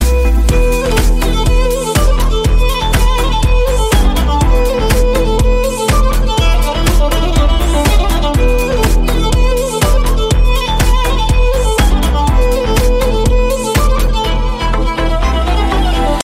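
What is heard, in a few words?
Arabic Violin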